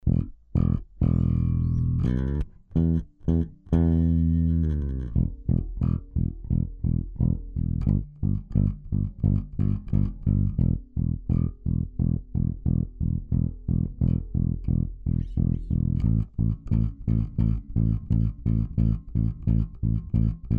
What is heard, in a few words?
bass